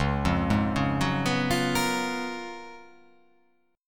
C sharp 13th C sharp

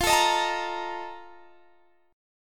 F 11th F, down, Guitar Open G

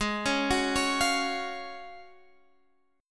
Gb Major 7th Suspended 2nd

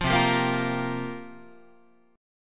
C 7th Suspended 4th, Guitar, chord

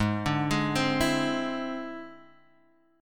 E Augmented 7th; chord shape

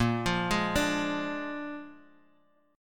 A sharp 7th A sharp

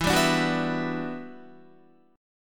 E Minor 9th, down, Guitar; Em9 strummed

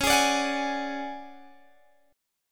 D Major 7th Flat 5th C sharp, chord

